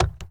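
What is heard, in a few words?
Truck Cherokee Door Open; SFX Kit; Trucks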